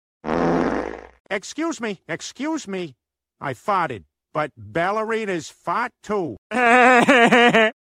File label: fart; peter griffin